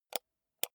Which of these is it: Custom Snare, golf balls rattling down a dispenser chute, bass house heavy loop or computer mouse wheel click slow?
computer mouse wheel click slow